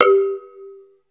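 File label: PERC 2 G3, percussion; SEQ AND PERC; Music Store - Claudius Diemeter Schwerlos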